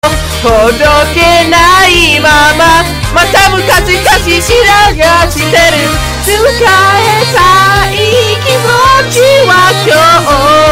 chippy sings

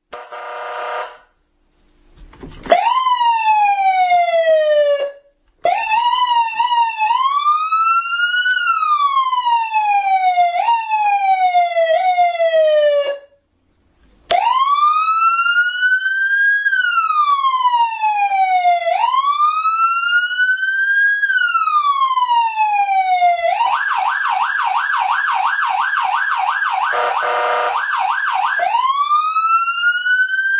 siren signal vehicle products ss650

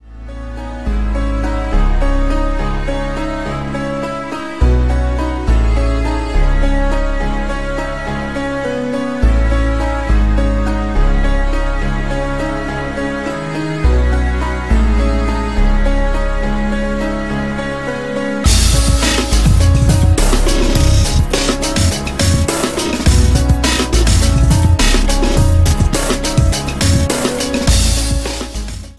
Piano Drop

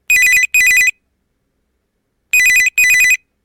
3D Ring

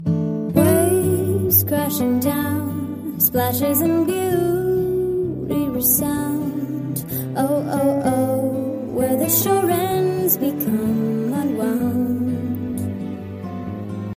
Bossa, ringtones

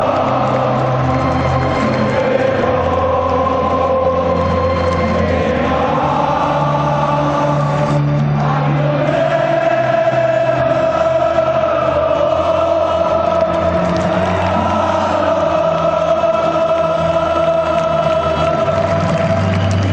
liverpool song

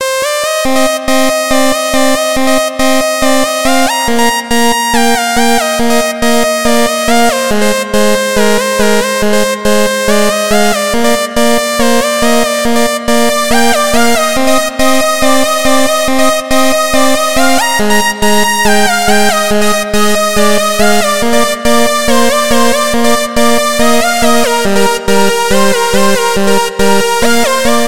Electro melody

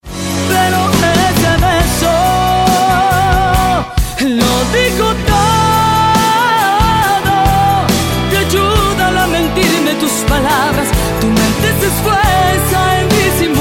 Is Calling Rap, ringtones

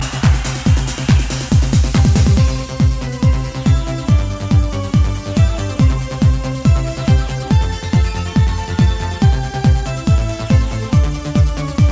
new year eve song

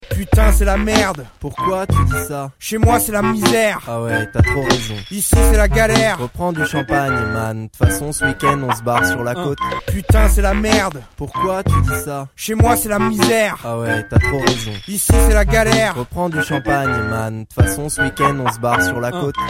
RAP; SPRINGTRAP

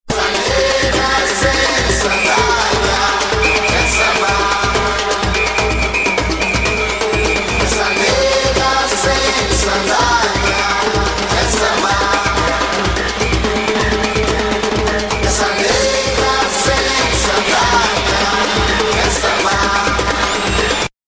Samba

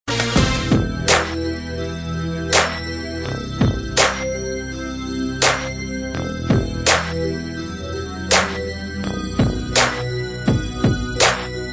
hip hop loops acid loops

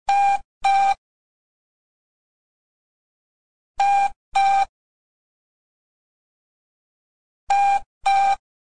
communicator hailing beeps

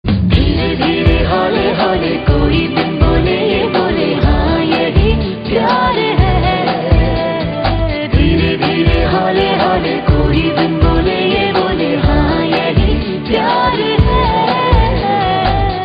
Is Calling Acoustic

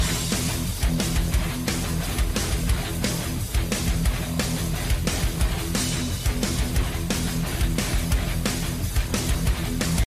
Riff, ringtone

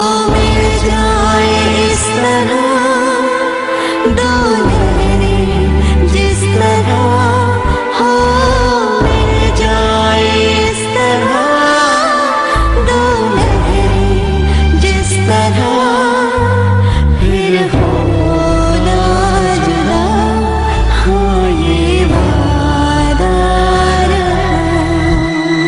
Unplugged

